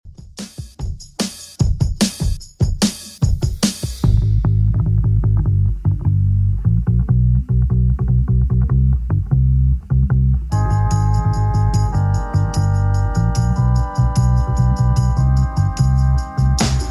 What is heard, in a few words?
70s Redrum 148bpm